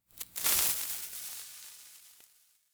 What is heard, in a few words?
tnt ignite